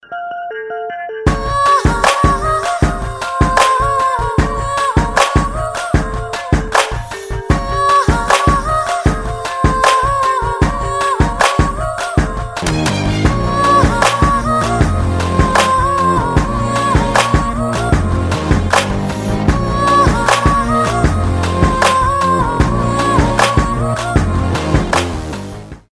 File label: Female voice melody